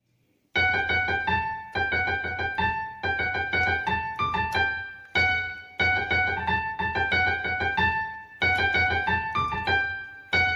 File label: song on keyboard; pallavi